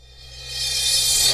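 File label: D2 crash, crash reverse; Best Service Dance Mega Drums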